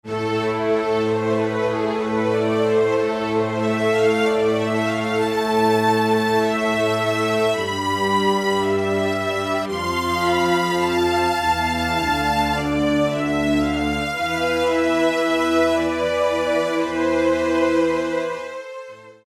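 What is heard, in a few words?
Bright Strings; Roland L-CDX-04 Strings And Orchestral Percussion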